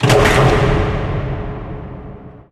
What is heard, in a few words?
clock tick; halloween; video games; source engine; tf